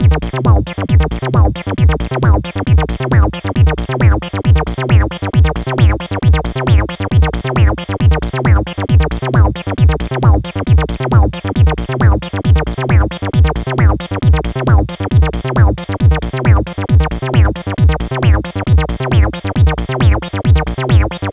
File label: Old Acid; Old-Acid-BAW; 100 acid vol 1